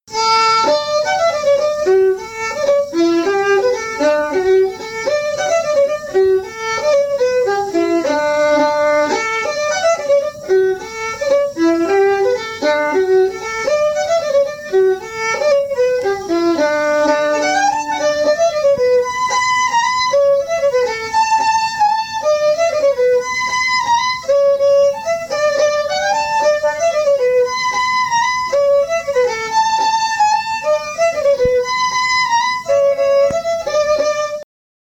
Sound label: fiddle; boys of county westmeath; SeamusGrantFestival